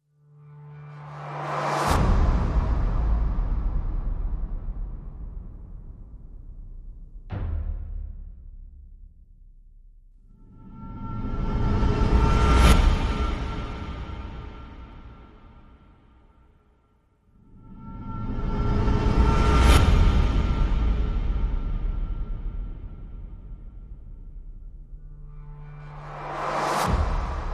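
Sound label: Percussion Only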